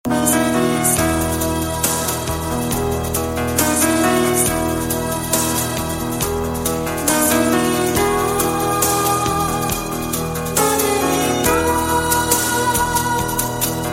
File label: Jeans Tone